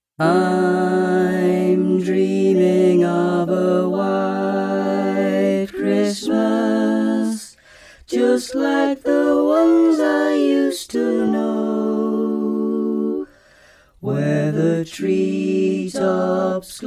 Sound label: - Men, Choir Harmonies; Carols